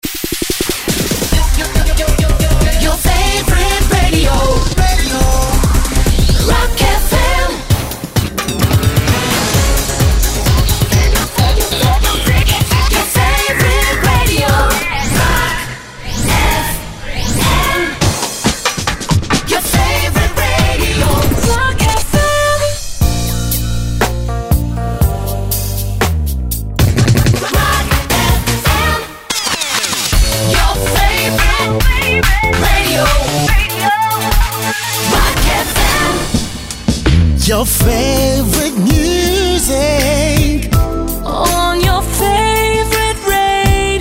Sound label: Web Jingles; Roc FM; The Kit